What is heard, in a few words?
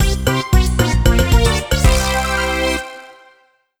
Target - Fall Out; Super Monkey Ball 2